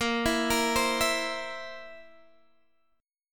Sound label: Bb Suspended 2nd Flat 5th, Guitar, Drop D